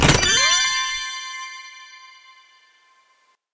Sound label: open Chest; BattleBuddies